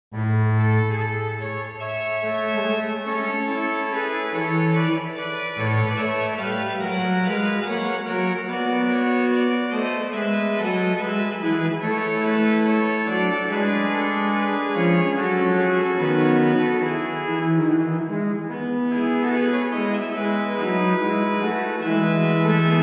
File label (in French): Antienne Cantabile in A, organ; Livre Souvigny